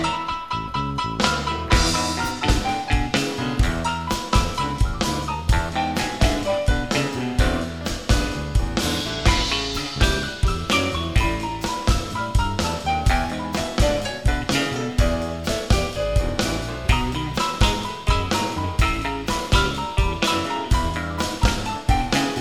Pentatonic Rumba Shuffle 12 bar Guitar Piano Phrasing